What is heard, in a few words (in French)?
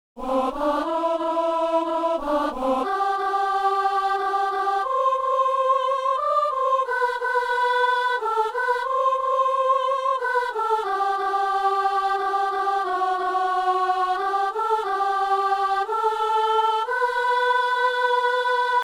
soprano